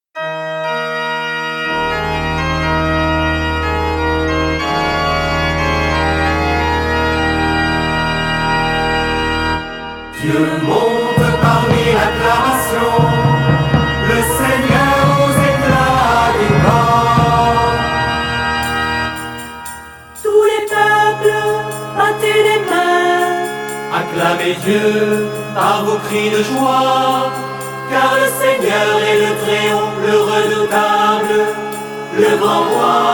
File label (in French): Psaume; 26 Baudrillard